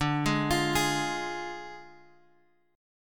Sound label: D Diminished D; Ddim Chord {X 5 6 X 6 4} arpeggiated